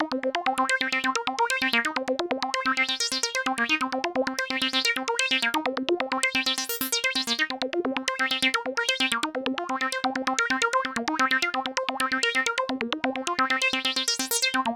Synth

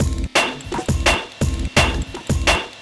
Breakbeat, Drums & Percussion

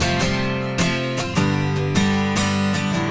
Acoustic Strum, Acoustic Guitars; Stringed Instruments

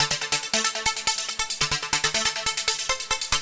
Synth

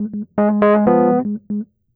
E. Piano

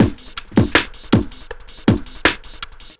Breakbeat, Drums & Percussion

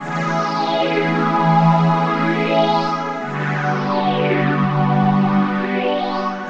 Pad

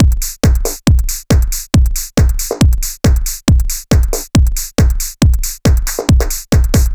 Dance Drumloop

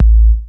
Kick, Drums & Percussion One Shots Drums

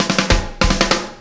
Acoustic Drumloop

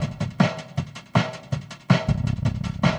Electronica Drumloop; Drums & Percussion

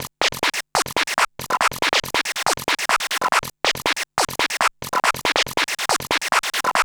Electronic Perc; Drums & Percussion; Percussion Loops

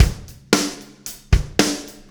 Acoustic Drumloop

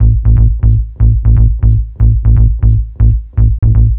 Synth Bass

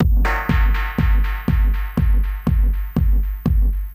Dance Drumloop

